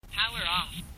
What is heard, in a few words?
power off